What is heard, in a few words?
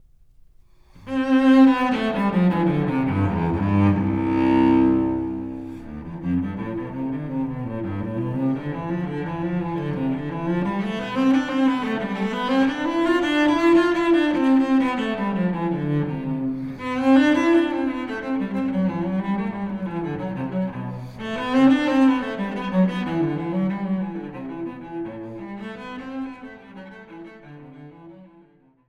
BWV1009 Suite No.3 in C Major Prelude